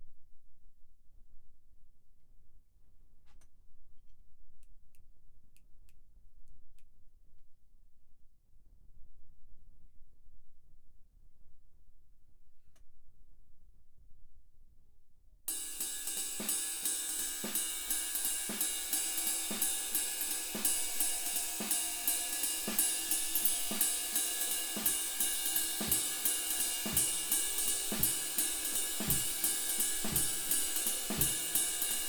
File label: Kit